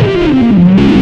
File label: xtra lead; Sonic Foundry (Sony Creative Software); Ugly Remnants VOL-2